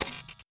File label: SFX Dynamite Puzzle Place Pin; Adam's Venture Origins